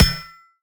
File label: purchase